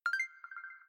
upgrade xp amount appear; my Buildings; City Verse Tycoon

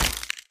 fall damage

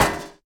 grenade bounce

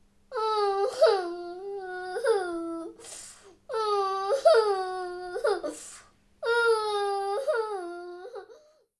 cry; plumber soda

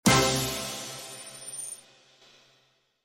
win hit

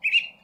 boop

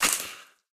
cactus damage; props

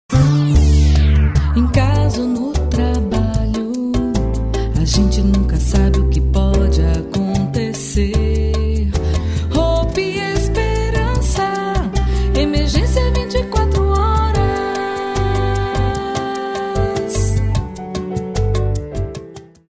Jingles

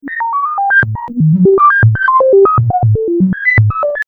robot

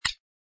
click

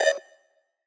Homing Missile Targeting

